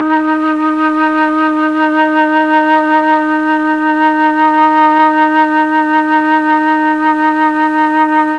D#2 TRON FLUTE, Mellotron; Mike Pinder - Mellotron Archive CD

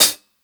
HH LOUD, HI HATS; Basement drums vol 2

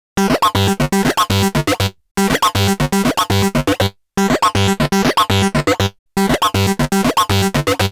Bouncey Lead, Deep Acid; Sonic Foundry (Sony Creative Software); Afterhours EDM

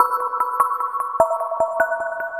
Shimmer Mallet

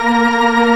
Organ, Barton+MortonMel

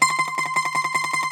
Greek Bazouki, Plucked Strings

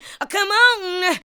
ADLIBS 90; Ueberschall; Jam Box

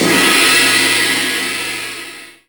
Drum Tracks; Best Service Gigapack 2

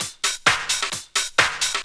130 addon, DRUMLOOPS, HIHAT; Ueberschall; Techno Trance Essentials; LOOP ADDON4

